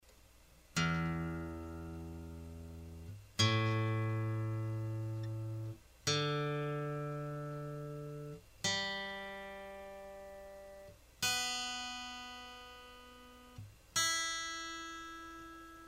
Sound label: Standard Tune, guitar